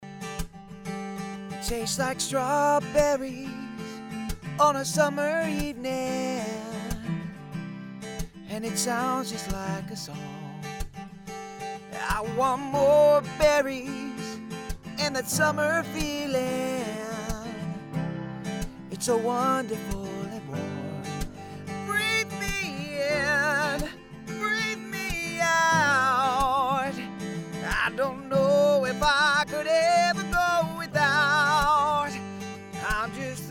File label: pop sample, singing